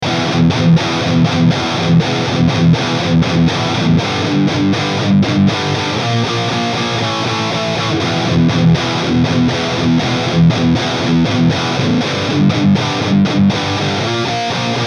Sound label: BRIDGE, eclipse active, 5150